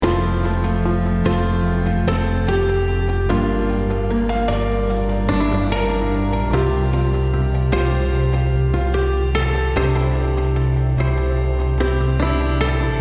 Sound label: Healing Melody